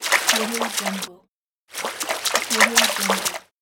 dog swimming

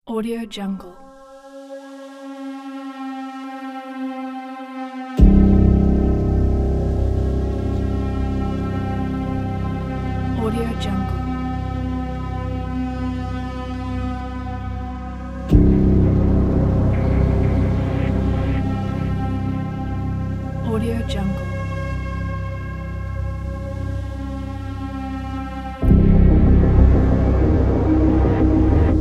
horror suspense ambience background

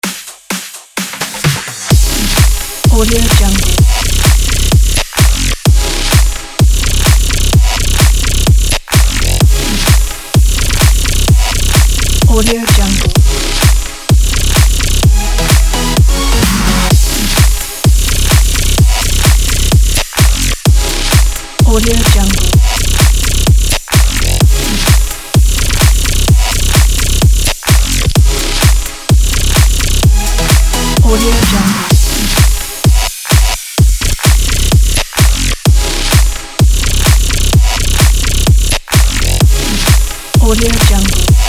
fashion glitch edm

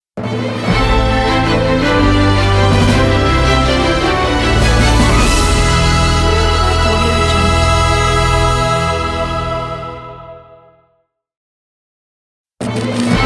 movie production company logo

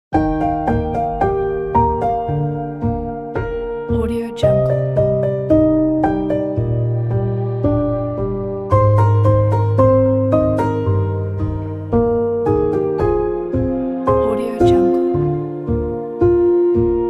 wedding piano and strings

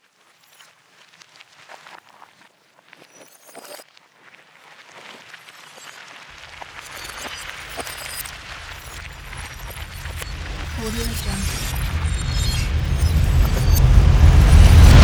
rise fx; welltone

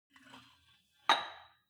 sport gym weight rack insert weight medium

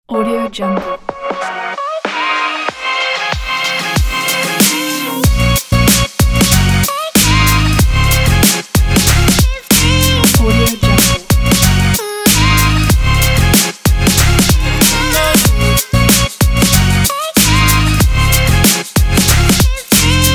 this hiphop is for hiphop

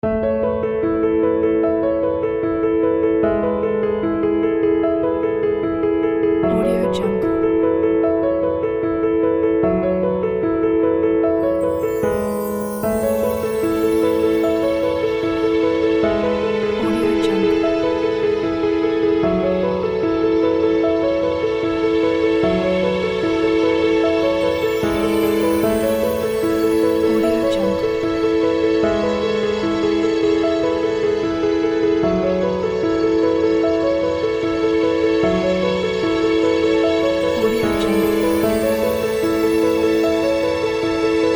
spring wind piano background

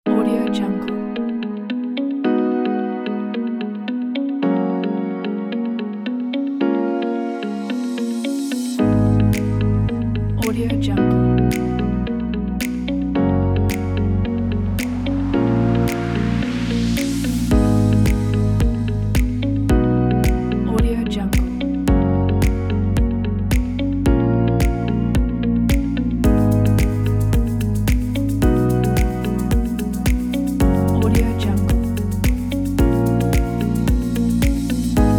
ambient music

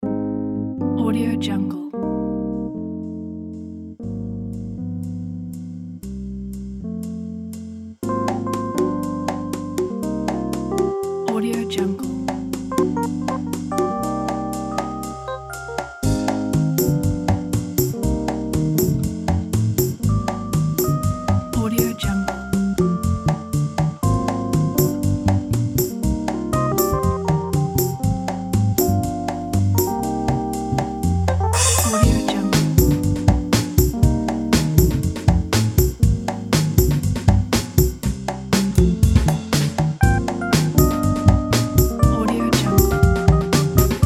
cocktail lounge

